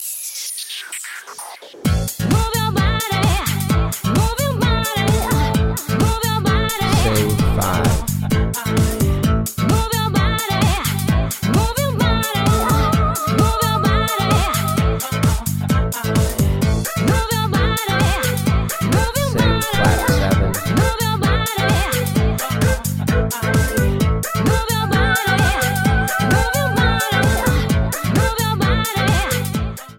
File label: Soprano Key of A, dance and techno music; Dance and Techno Music Ear Training; Practice Perfect